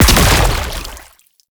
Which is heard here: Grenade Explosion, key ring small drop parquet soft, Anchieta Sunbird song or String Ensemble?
Grenade Explosion